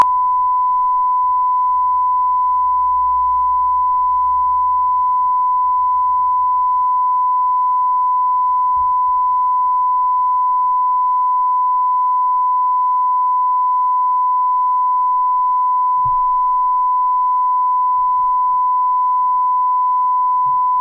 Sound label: Calibration 94dB; Gain=+10dB